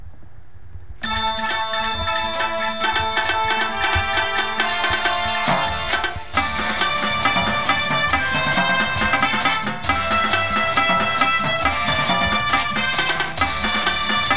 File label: tenors with music, drumline